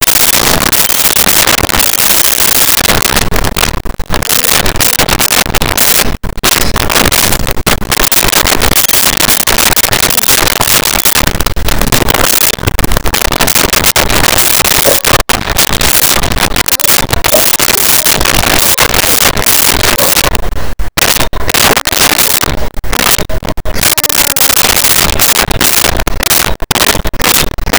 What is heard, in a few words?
Dog Whining Begging; Animals; SONY PICTURES SOUND EFFECTS SERIES